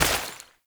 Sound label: Step Water